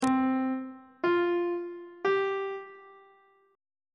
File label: d double flat suspended 4th triad chord intervals on treble clef